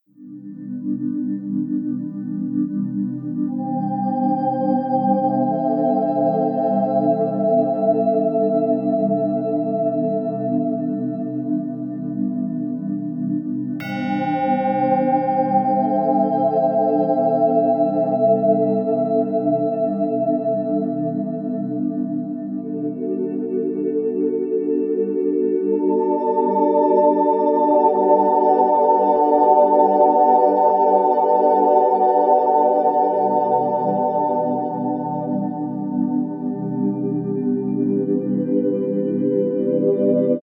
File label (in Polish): Ambient Muzyka Relaksacyjna